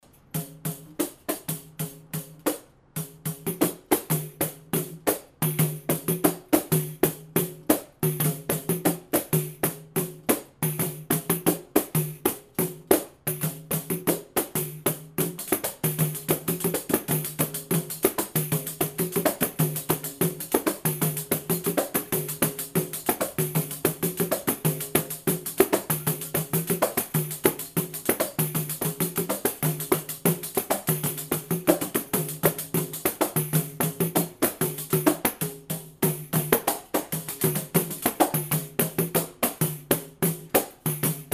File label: Partido Alto Both Parts Together